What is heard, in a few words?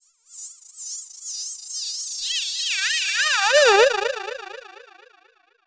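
Filter Processed, alarm siren samples 125bpm; musicradar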